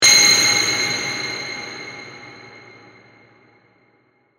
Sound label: Hit Metallic Iron, Impact, Ring; Production Element, Imaging Element, Accent, Transition; BlastWave